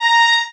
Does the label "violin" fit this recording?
Yes